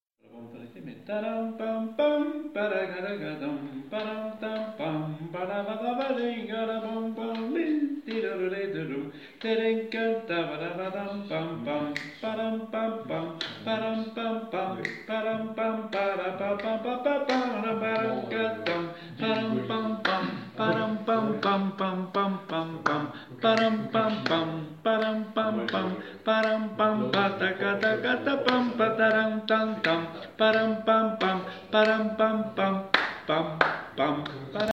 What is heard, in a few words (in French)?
avignon a capella; deambulation